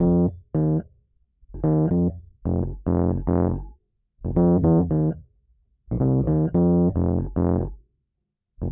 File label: E. Piano